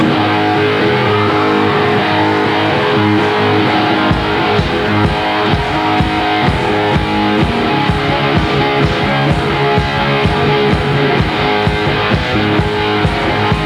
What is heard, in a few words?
Guitar Solo